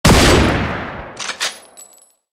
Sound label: armor piercing sniper rifle shoot; pixel gun 3d